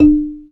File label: marimba D4, Ghana Marimba